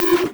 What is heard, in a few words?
Multimedia Internet CD-Rom Flash Wipe